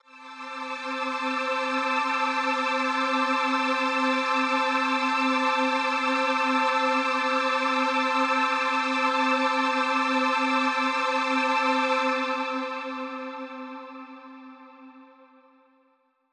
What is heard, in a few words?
Mach String C6:9, synth orchestra